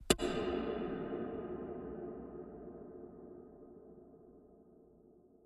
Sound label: pickaxe reverb; seeding-planets